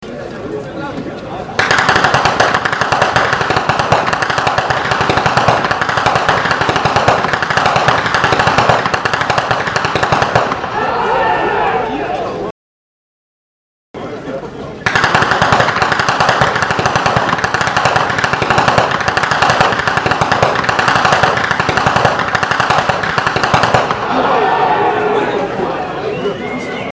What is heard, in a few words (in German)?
schnalzen; ainring